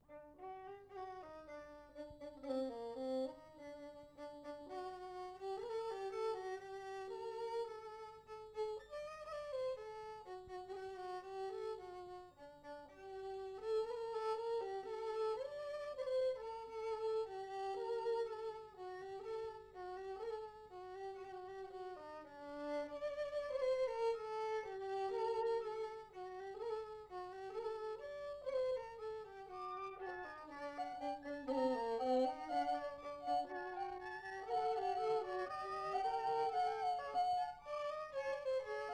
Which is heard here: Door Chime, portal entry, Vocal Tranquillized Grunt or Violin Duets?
Violin Duets